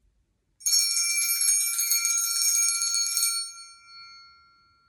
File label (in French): triangle; Conte 22 et 23